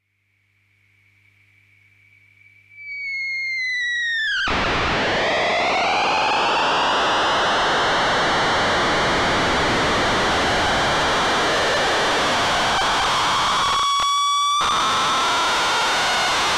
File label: analog